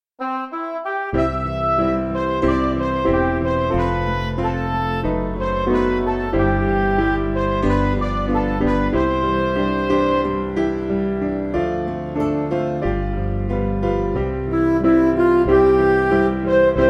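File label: Music Backing Files for Churches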